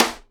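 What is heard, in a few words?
SIDE STICK, Live Snares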